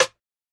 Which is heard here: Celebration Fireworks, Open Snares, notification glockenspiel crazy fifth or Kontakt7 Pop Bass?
Open Snares